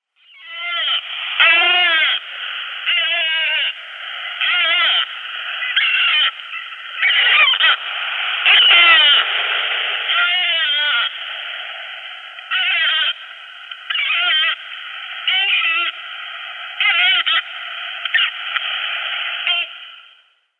Yellow-nosed Albatross

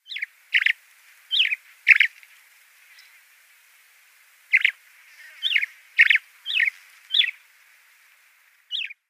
Desert Lark call

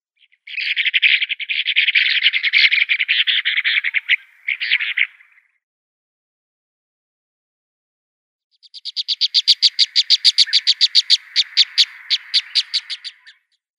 Egyptian Plover

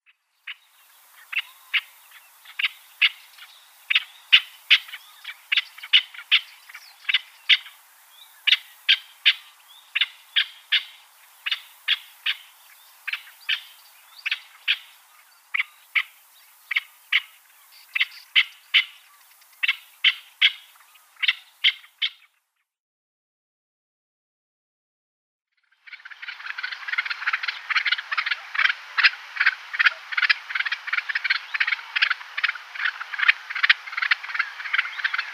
Barbary Partridge male display

